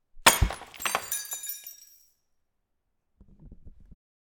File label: Glass Breaking